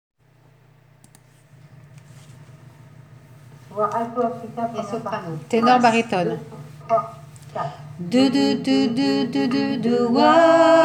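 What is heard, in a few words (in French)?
voix ténor barytone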